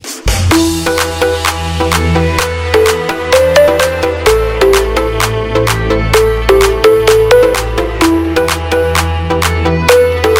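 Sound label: ringtone beautiful melody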